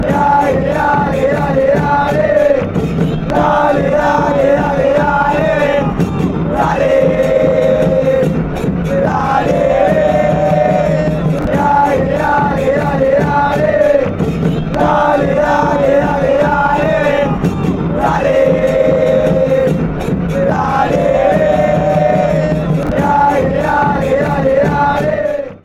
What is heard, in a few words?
come on come on greens, chants